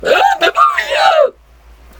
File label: rahhhaaaeheheheheheheh